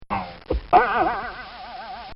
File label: Pop And Spring; Springs